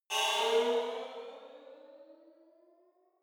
sci-fi vehicle turbo activate; raumschiff

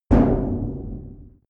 medium metal impact

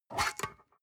storage lid slide open hard; objekte

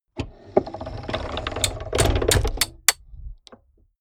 mechanics medium rattle

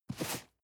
cardboard box medium slide short hard; objekte

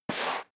step dress boots sand slide short; schritte; menschen

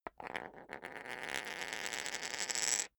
poker chip spin; soundpool

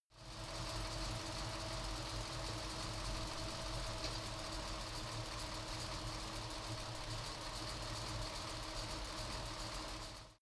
car camaro idle engine

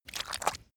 step mud slow normal

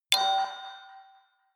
notification signal; kommunikation; signale

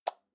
light switch on

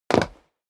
step sneaker wood parquet jump; schritte

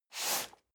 cardboard box medium slide short; objekte

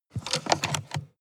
sports car int drink holder close hard short; verkehr-transport

